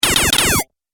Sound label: Rapid 4 Laser Shots, Laser Gun Sound Effects; Sci-Fi Laser Sound Effect